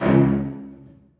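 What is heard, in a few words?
celli staccato c2; Sonatina Symphonic Orchestra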